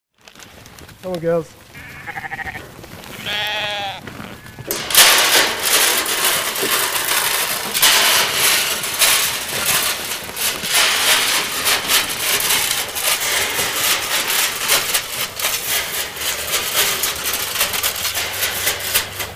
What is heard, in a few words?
farmer tips sheep food from plastic sack into long metal trough